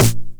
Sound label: 909 SD Tape Sat